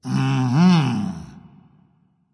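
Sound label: nonverbal agree; smite; voicepack; ullr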